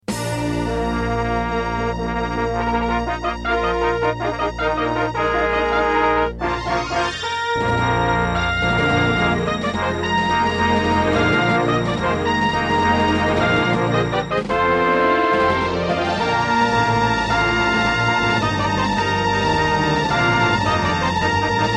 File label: Intro, Diamond Monster Sound 2MB; Dark Forces